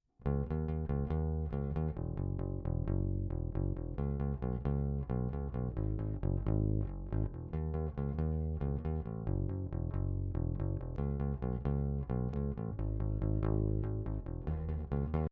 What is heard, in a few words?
original bass